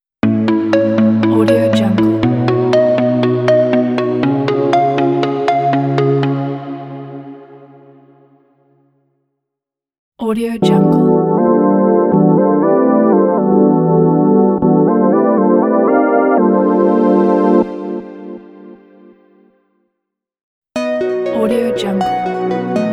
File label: ambient abstract logo pack